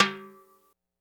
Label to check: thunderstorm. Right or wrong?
Wrong